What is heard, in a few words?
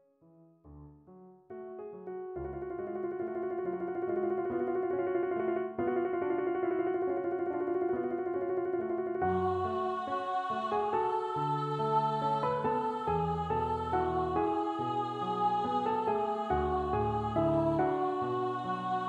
BASS piano accompaniment training score; Pavane Op. 50; Gabriel Faure